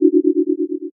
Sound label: mystery; noteye; roguelike